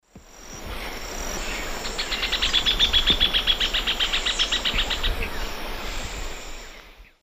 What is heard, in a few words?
Furnarius minor, song; S. America; Eastern Lowlands